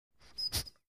Misc. Sports Elements; Empty Water Bottle Being Squeezed